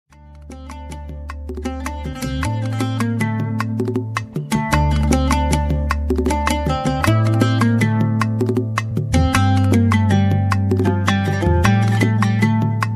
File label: Acoustic Arabia; putumayo